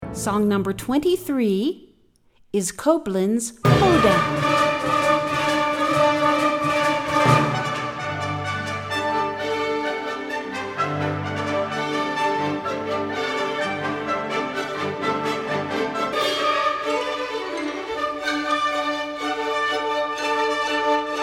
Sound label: Hoedown